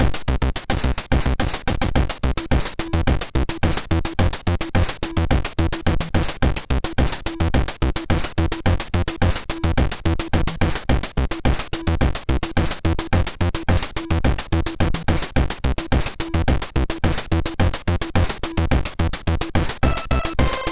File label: sid, 6581R3